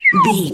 whistle slide down

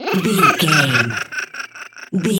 goblin fairy laugh low

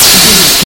retro spaceship jet boost

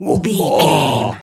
voice fun man character deep laugh

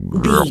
frog deep croak

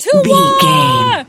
voice female battle shout to war

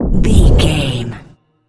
bullet leave barrel slow motion

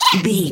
goblin fairy attack

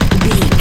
sci-fi weapon auto turret loop

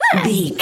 voice fun small character emote angry

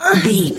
voice female effort push fast

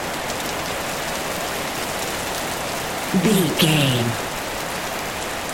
rain heavy loop

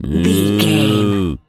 cow moo

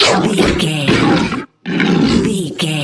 troll monster death

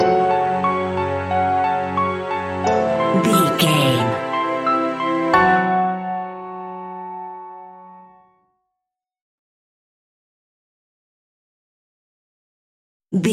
modern horror stinger; Video Game

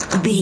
door lock handle locked

